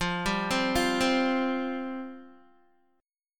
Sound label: F Suspended 2nd F Guitar; Fsus2 arpeggiated; JGuitar